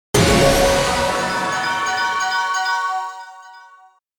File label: Casino slot machine jackpot big win